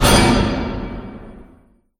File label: hooked zombie metal; scripted sequences